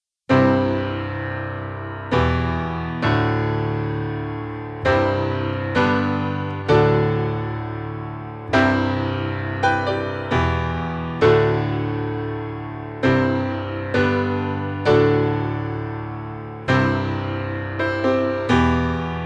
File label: PIANO